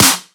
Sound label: snare; 7rystan's Snare Pack 2018